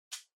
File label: Portable MD Far Earphones Unplug